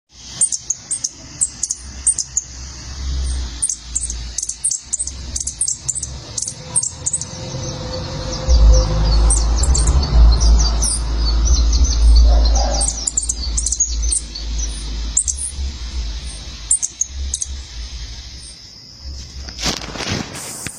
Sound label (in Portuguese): canto do tangara de coroa amarela